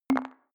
click code room, signale

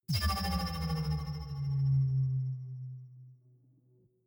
energy shield shut down; energie

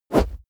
swoosh close; swooshes-swishes

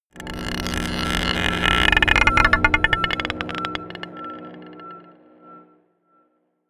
scrapes marble pot dark, drones

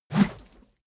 whoosh dirty deep; swooshes-swishes

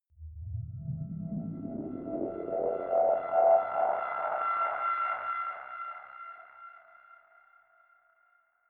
static string slow; risers

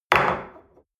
espresso place on table hard

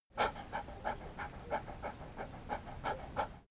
dog pant; natur-tiere; hunde

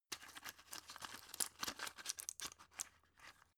drug pull out shaky; berufe; arzt

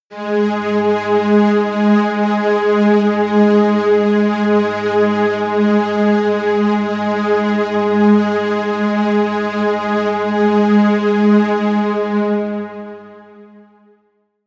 drone synth string; musikelemente

